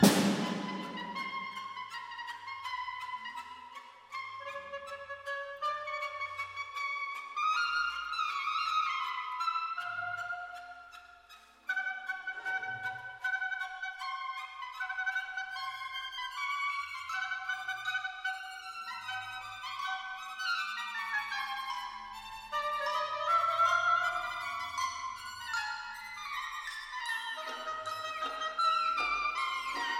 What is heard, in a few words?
Concerto for Harp, Oboe and Orchestra Marciale e grotesco